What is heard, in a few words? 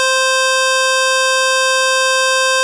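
string C4; fleet keys Samples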